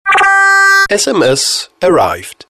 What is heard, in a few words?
Sms Arrived